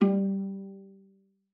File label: VIZP G3, VIOLA PIZZ; Xsample VOL-5